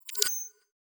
temporary help, ui

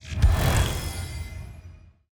ui

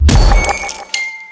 music box

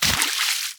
water splash

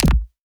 ui btn

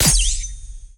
imp brighten sword

